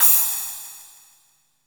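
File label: LARGE SPLASH, CYMBALS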